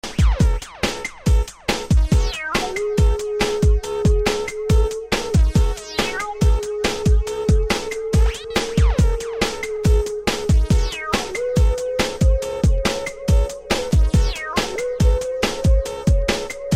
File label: Instrumental; detroit